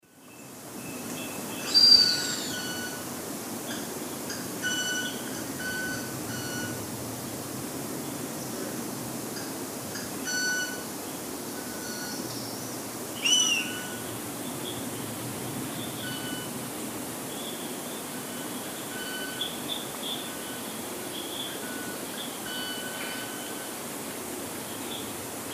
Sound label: Accipiter bicolor, calls 2 birds; S. America; Eastern Lowlands